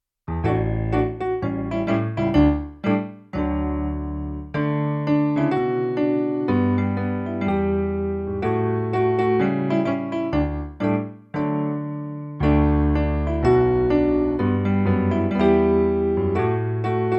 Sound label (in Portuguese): playback hino